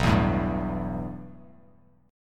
B 7th Suspended 4th Sharp 5th B down Bass Standard; B7sus4#5 strummed; chord shape; JGuitar